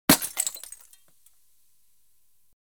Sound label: Glass, Beer Bottle Breaks; BBC Sound Effects Library; Explosions Guns Alarms